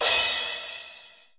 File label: normal crash, DRUMS (SINGLE HITS), Crashes